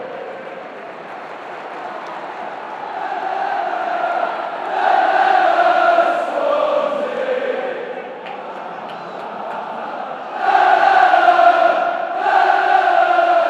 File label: swansea city fc nah nah nah swansea plus chanting